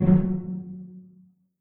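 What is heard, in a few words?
string pizzicato F#2 pianissimo right; Sound On Sound - Peter Siedleczek's Orchestra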